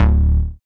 dance bass; Sound & Vision - Gigapack II CD 1

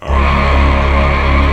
VOICE, THRILL VOX

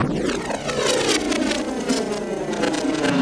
FX Loop, Drums & Drones

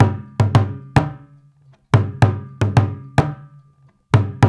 Ethnic Drums, African Contemporary; SUNU; Spectrasonics; Supreme Beats

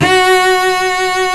arco cello; Proteus2

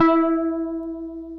PIANET; Sonic Reality; Vintage Timetraveler; CLAV PIANET

